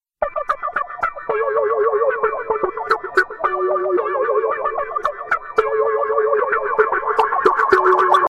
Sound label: funky sms ringtone